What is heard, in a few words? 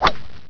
Golf Maximize; Microsoft